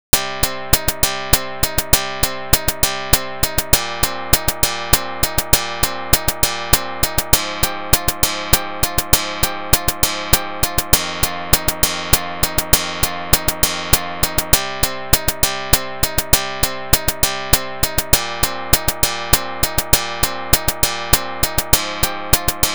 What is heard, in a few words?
karplus strong